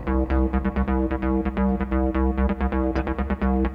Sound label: rezo synth